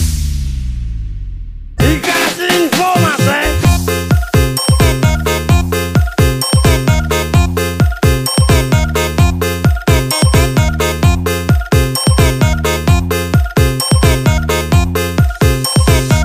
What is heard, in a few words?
dj ting ting